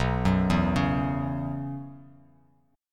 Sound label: B Suspended 2nd Suspended 4th, Bass Standard